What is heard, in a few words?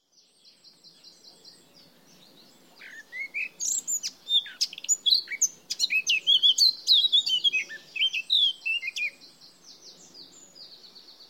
Eurasian Blackcap Sylvia atricapilla